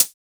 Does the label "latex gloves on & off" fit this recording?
No